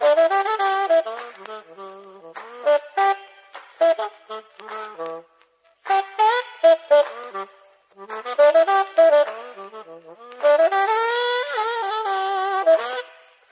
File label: sax clap along; Loops; Phonky